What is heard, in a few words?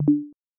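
message new email, Ubuntu System Sounds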